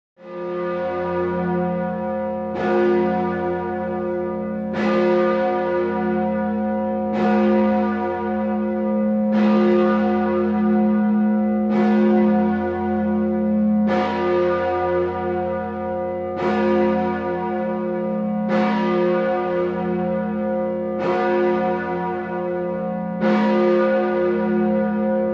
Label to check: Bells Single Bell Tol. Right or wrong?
Right